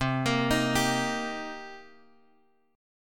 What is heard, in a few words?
C Minor 7th C Guitar Drop D